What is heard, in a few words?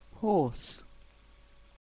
horse